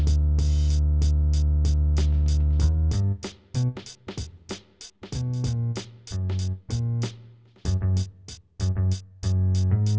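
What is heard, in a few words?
with drums inst mix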